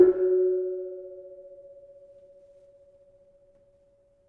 Keno 4 F Sharp, gamelan tuned; gravityharps

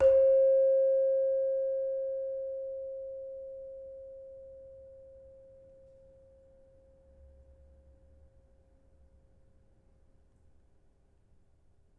Saron hard, gamelan; gravityharps; timbrebox

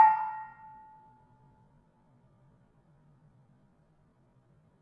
Barung 5 A, gamelan tuned

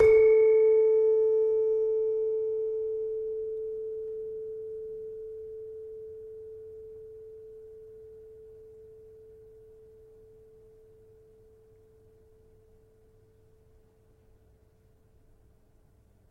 Demu 4A, gamelan tuned

